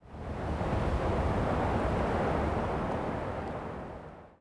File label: wind CALM; The Elder Scrolls III - Morrowind; Morrowind GOTY GOG